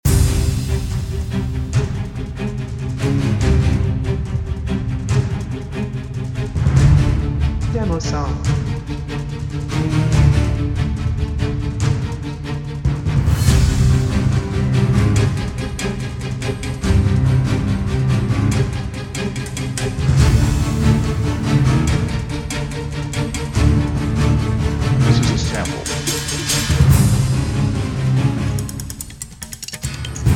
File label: Loop